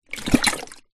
household kettle water movements

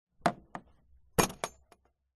industrial empty plastic tool box metal latch

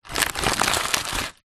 food popcorn paper bag movement

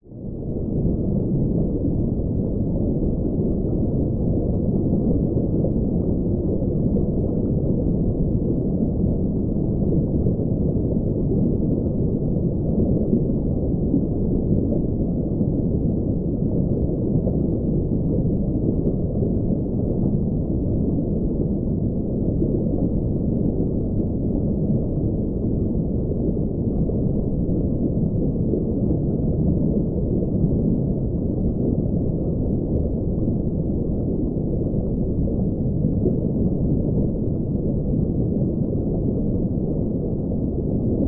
nature underwater ambience deep

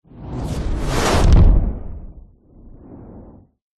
fantasy magic spell dark whoosh into thud

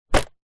wood panel fall over hit ground; zapsplat

